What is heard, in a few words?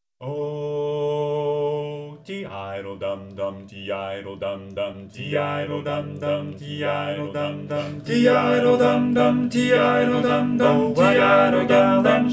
tenor predom; 2024 spring show